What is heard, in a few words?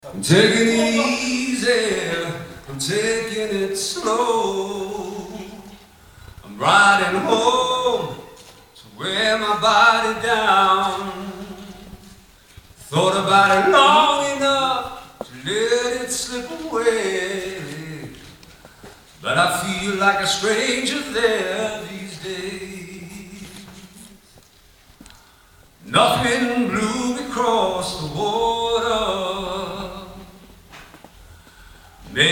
Acapella, Live